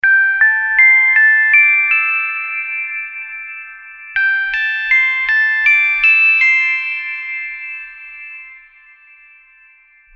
SMS phone

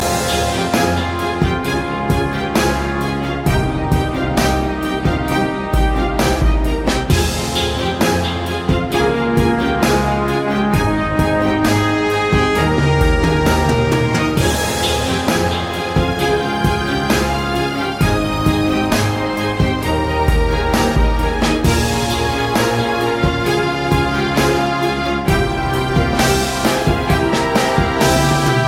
Game Music

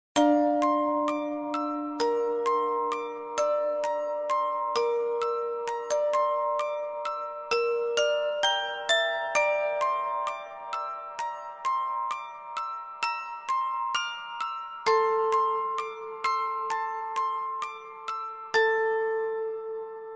melody

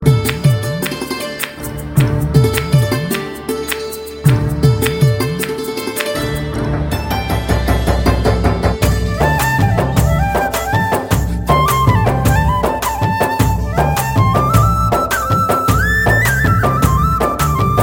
Santoor Clap Dhol

